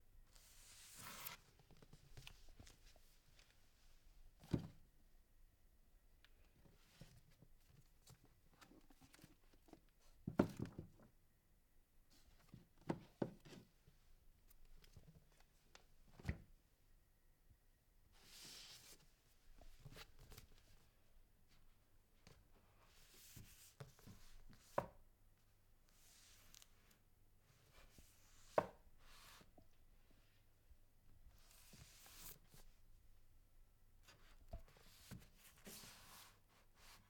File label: Book Placement